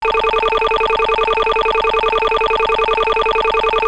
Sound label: PICOPICO; Let's Play with Sakura!; PITTA